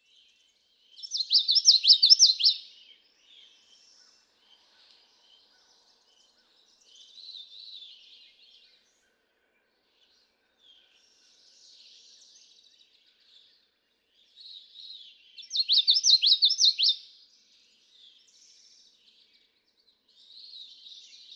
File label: Common Yellowthroat